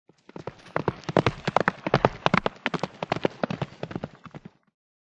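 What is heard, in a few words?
By Horse Gallop Up Past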